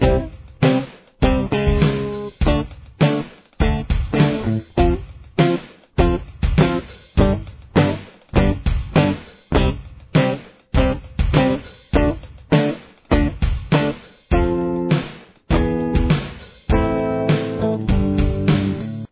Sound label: chorus guitar